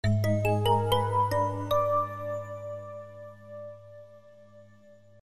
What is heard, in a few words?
secret door closed